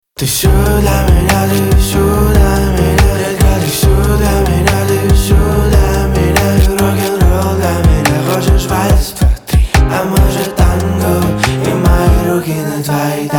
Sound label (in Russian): Solo na sax